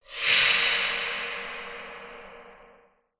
Soft Gong

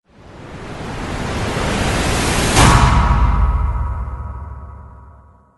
Wave Completed; sas zombie assault 3 2011 gamerip pc android ios

